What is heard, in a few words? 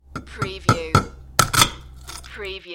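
Egg Open Knife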